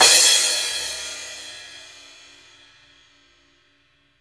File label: CRASH, Drumworks; Sampleheads; New York City Drumworks VOL-1